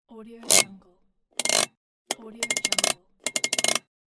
car handbrake

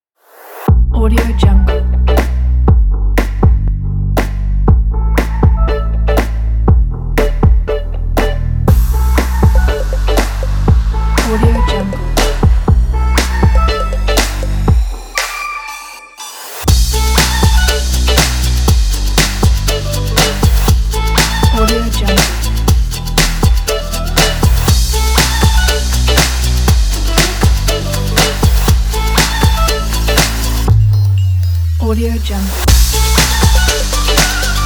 happy pop disco

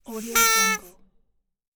party horn sad

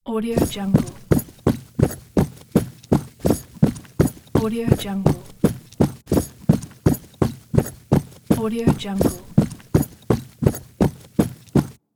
soldier armoured walking footsteps

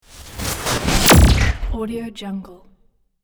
lightning spell

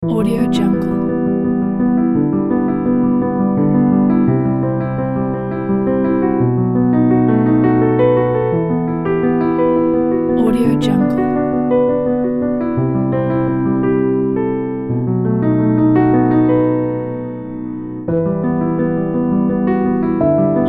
romantic sentimental piano

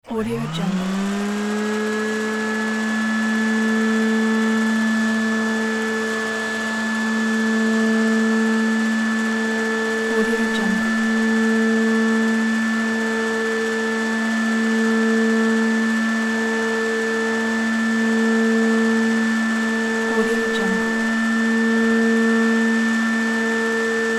electric lawn mower; sound ideas

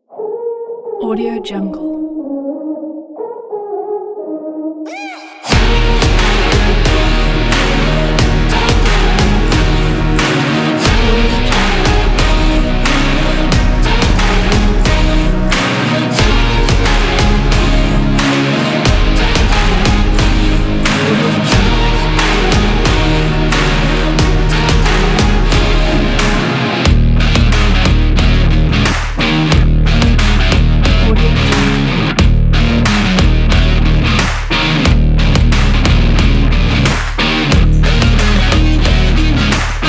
energetic epic rock sports

